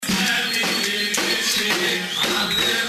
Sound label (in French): rythme musical